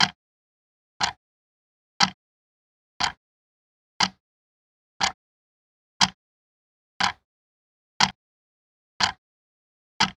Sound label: Wall Clock Denoised